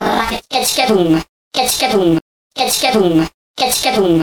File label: rockit robot, 113bpm